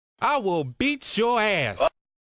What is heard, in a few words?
beat